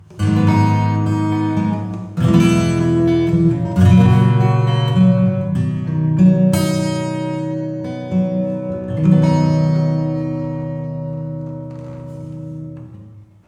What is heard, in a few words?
Plate Acoustic Bright Acoustic Plate; UVI Plate